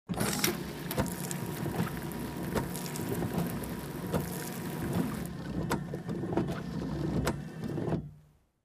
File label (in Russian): Avto dvorniki zvuk podachi vody